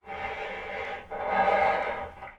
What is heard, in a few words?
Player UI, sfx; Five Nights At Akers; soma